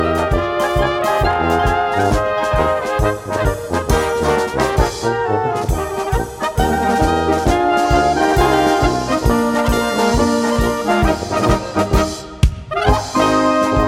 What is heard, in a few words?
Polka